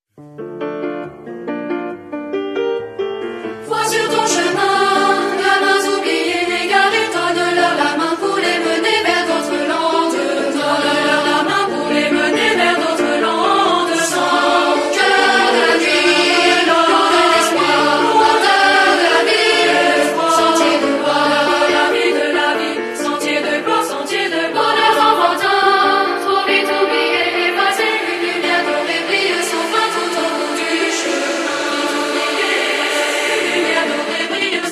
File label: techno mix